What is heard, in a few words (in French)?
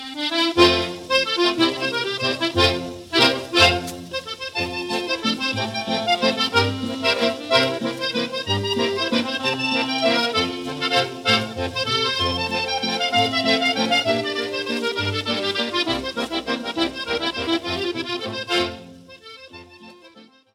Landler - Autriche